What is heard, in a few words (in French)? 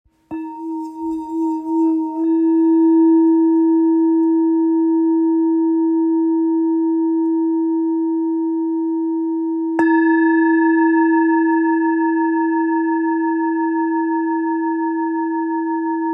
Bol mi 760g